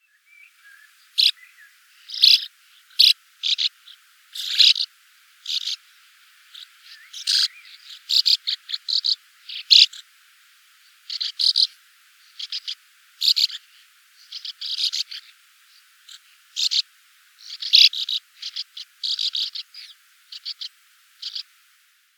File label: Sand Martin